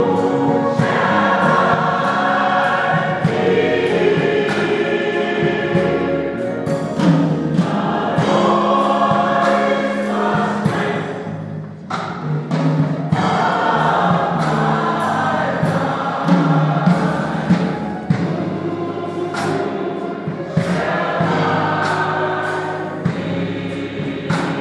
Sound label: choir at martin luther king celebration